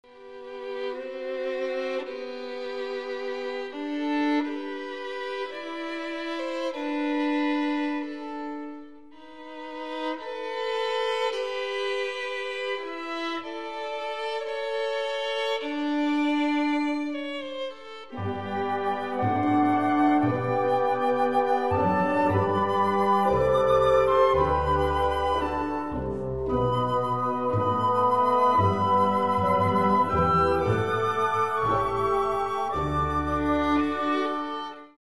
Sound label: Romance For Violin